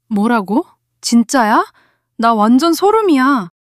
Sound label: surprise